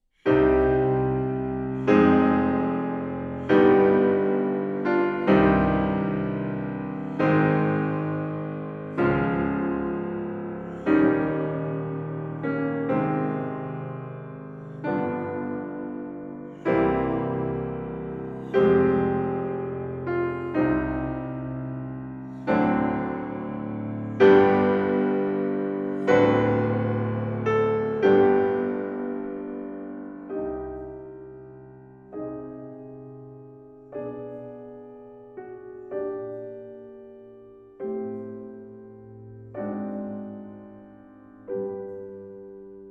Chopin Twenty-four Preludes, Op 28 - No 20 in C minor Largo; Linn